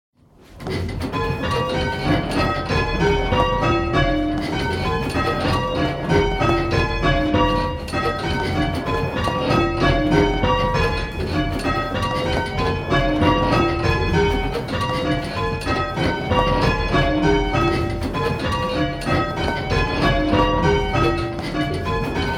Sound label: Penta2 Grandsire, bells; Pentatonic; Stedman Doubles